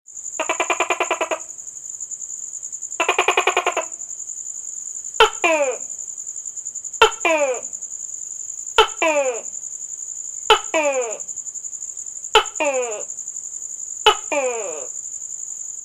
sound of lizard

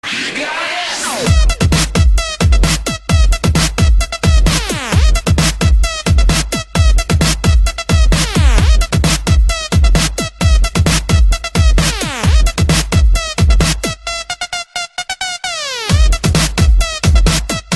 House Music

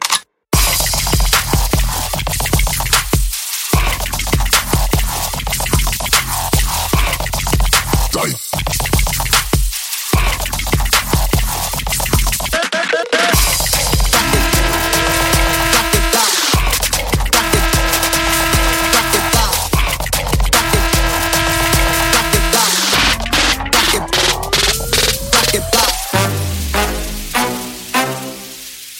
Trap

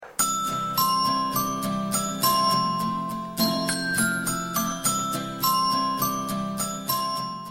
sms

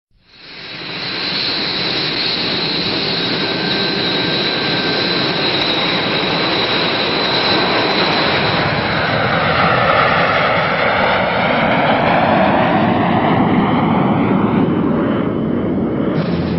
Jet Takeoff

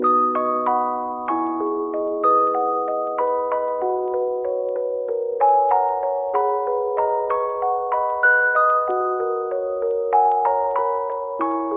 Music Box

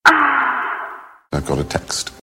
moan, sms; ringtones; irene adler

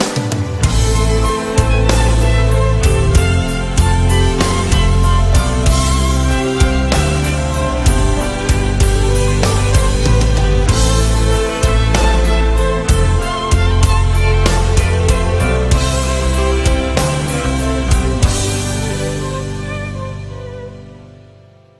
Symphonic Rock

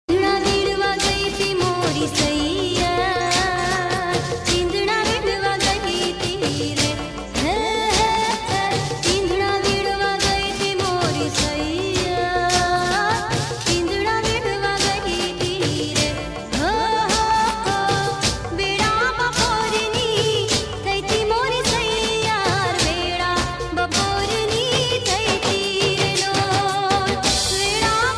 garba; rock

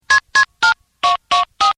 tone, ringtones; latino

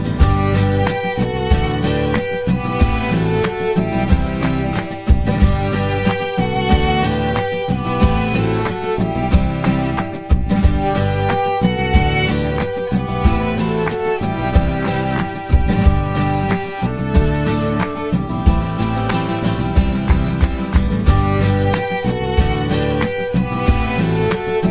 violin

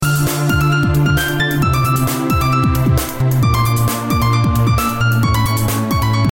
love piano

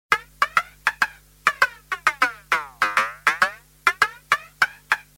jazz sms, ringtones

